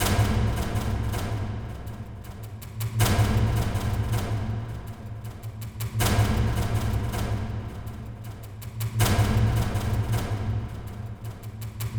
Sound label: A 160 bpm; castaway